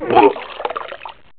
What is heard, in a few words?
Vomit Sound; Slick Willie